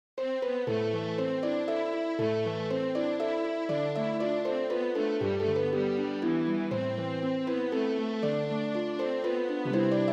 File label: harp viola; Carolan's welcome